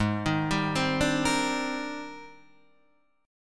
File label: Ab Major Sharp 11th Ab, Guitar DADGAD; down